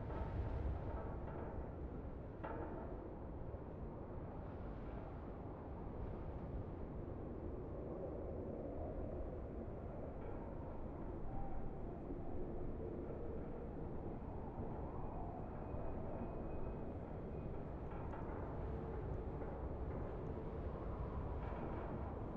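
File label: ambience exterior global; Titanfall 2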